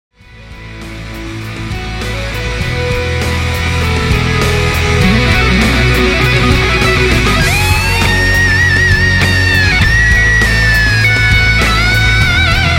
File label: SOLO; C1 Solo; Hard Rock; Schecter C1 FR; Lead (solo)